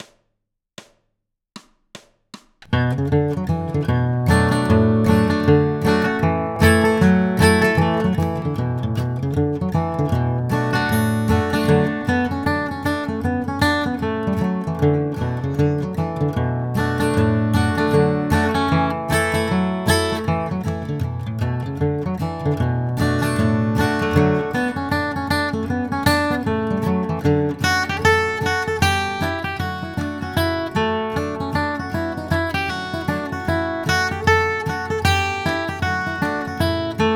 Bluegrass Guitar, fiddle tune out of C with some longer 8th note runs